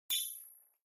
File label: Button Thin Sci Fi B; buttons & beeps